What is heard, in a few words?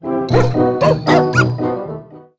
dog music